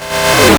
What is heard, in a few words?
Arcade Riffs